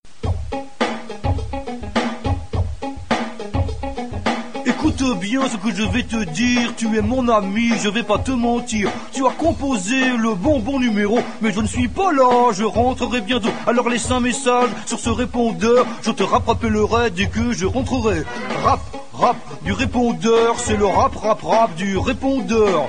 Rap, repondeur

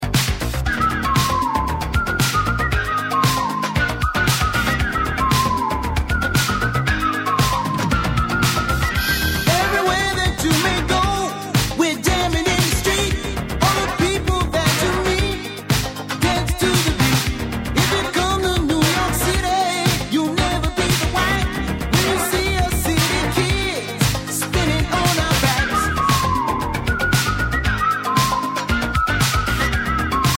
Street Dance

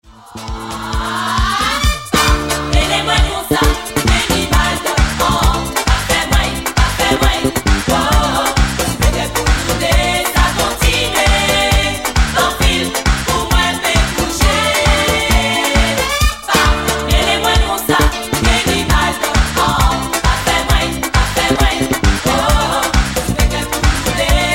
Zouk

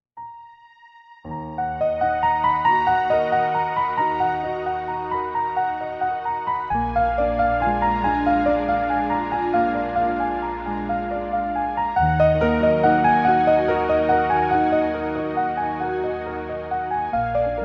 Piano; classique; Drame

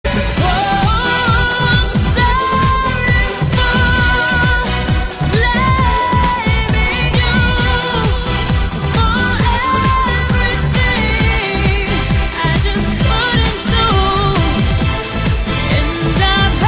remix

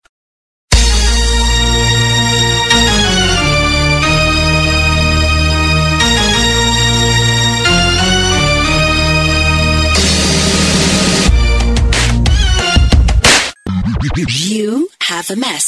Halloween Remix

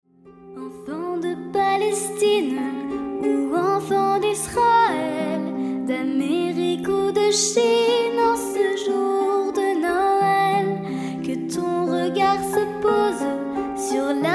X-mas Tones

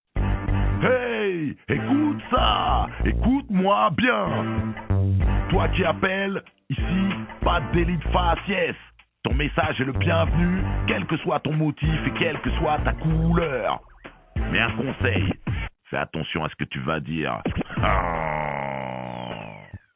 Hip Hop

